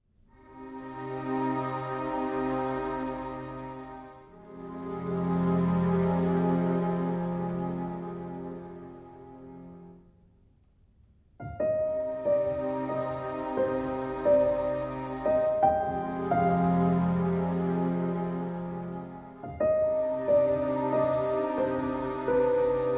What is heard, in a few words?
Silent Lament slow